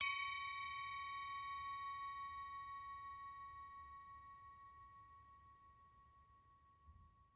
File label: Elephant Bell, bells